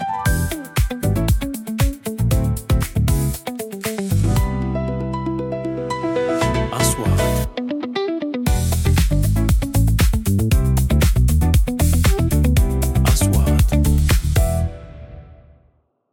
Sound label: advertisements